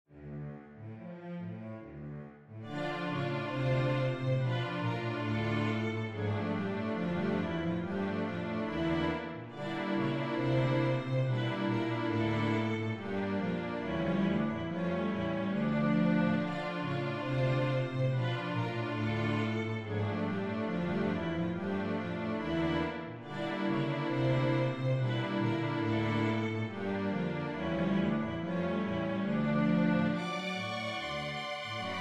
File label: habanera, string bass